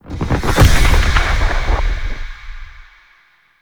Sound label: Slam Impact; Enemy; Characters; Abilities; Mendicant; Mnd King